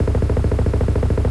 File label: rotor, heli